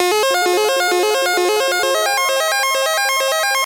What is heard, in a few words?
Space Alarm